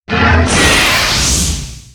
dual breath; weapons; dota; jakiro

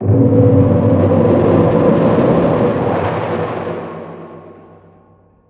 ink swell cast; weapons; dota; grimstroke

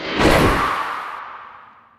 counterhelix; weapons; dota; axe

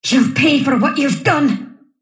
sylph death; dota; dark willow

